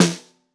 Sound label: Snare Bottom